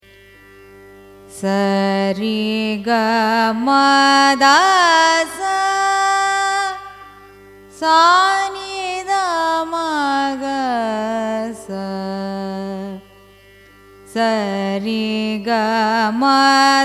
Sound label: raga ranjani arohanam avarohanam, carnatic music